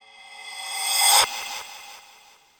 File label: Reverse